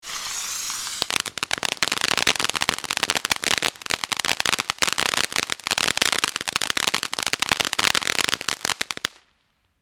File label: Fireworks firecrackers fountain small crackle pops